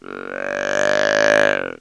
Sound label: aggrunt, deer rattling; practical bowhunter